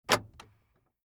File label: car central lock; transportation